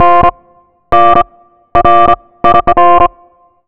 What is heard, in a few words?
Synths, Underground UK House & Electro